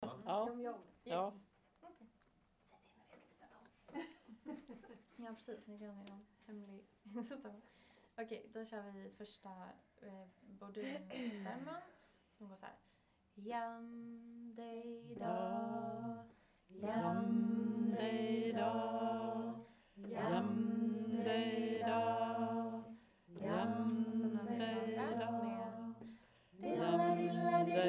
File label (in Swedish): Bordunstämmor